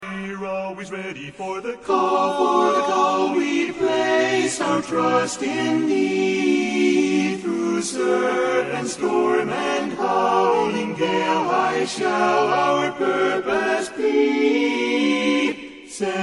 armed forces medley Coast Guard tenor, chorus

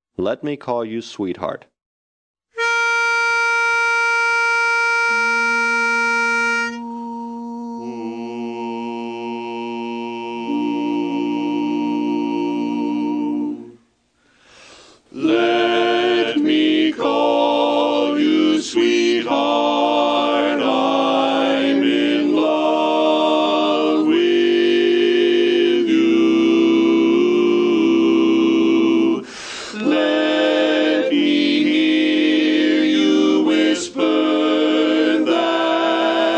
Tenor

